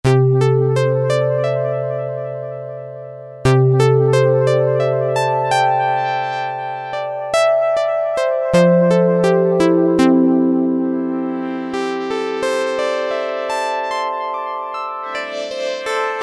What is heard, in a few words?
Dreamy Sine, Roland V-Synth; Factory Patches